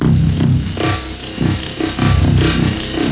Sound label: stretched to no end, drum loops and breaks, 077 bpm; 000 to 080 bpm